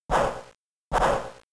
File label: Bulk Up